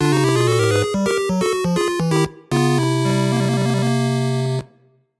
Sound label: Race Lose